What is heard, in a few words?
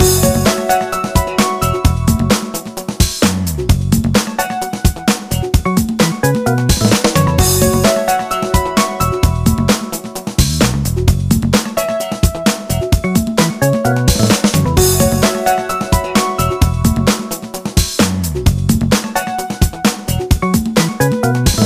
Music Sub Menus; Let's Golf; PSP